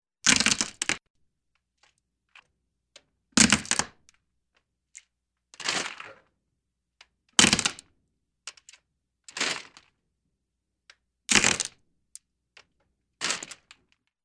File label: Bones Cracking